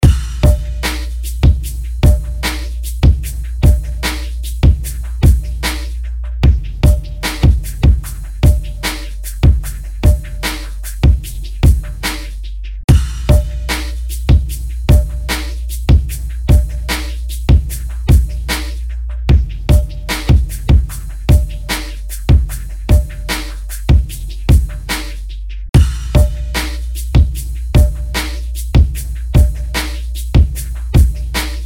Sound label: SCRATCH